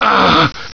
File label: hurt